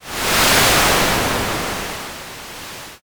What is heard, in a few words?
wind